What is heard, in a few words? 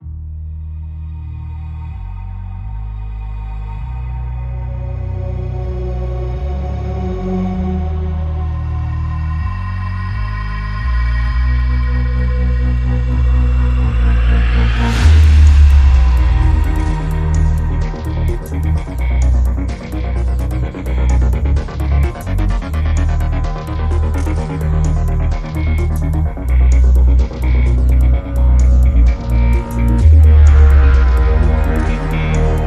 Electronic